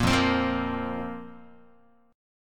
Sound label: F Suspended 4th Guitar, chord; Fsus4 strummed; JGuitar